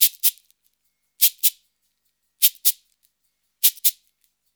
Modern Percussion Loops; Soundscan vol.56; AKAI

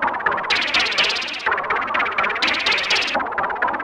Juno-60 Arp 125BPM

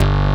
SLAP BASS

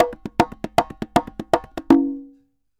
Congas Poly 120, Drums & Percussion; Best Service; Festa Latina VOL-1

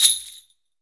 SUZU

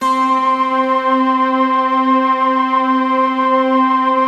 HARPS, Harpsiflute, Harpsi Stack; Optical Media International; Sonic Images Library

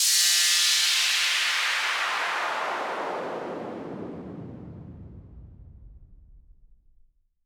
Downlifter; 99Sounds x Monosounds

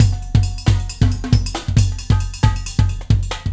Warp Drive Beat, Processed Drumkits; Sonic Foundry (Sony Creative Software); Processed Drumkits Zero Gravity Beats

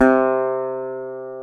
GTR, Dobros, National

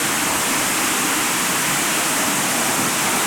Ice Cave, On The Glacier

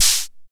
HH, HH Synth

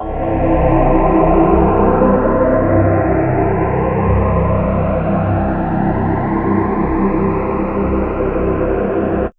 Dirty Pad; Zero-G Sound Sense Grime Scene; 90 s Sample CDs

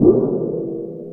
Steel Sheet Bent Mallet; Metal; Sonic Foundry (Sony Creative Software) - Ambient Atmospheres & Rhythms; Ambient-Metals

